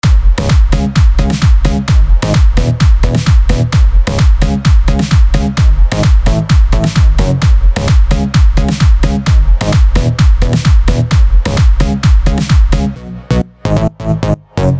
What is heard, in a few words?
Dance